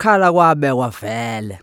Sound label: Vocal Chirp, African Voices